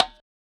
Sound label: Percussion Conga Shot